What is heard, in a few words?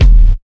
original bass drum D sharp key; kick drum samples; one shots